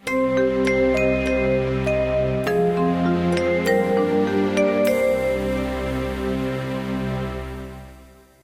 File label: level lost; Atelier